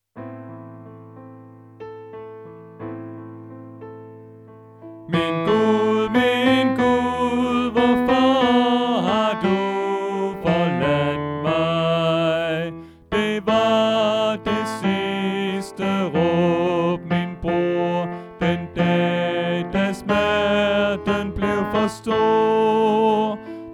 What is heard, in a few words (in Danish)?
Tenor; Påske; oevefiler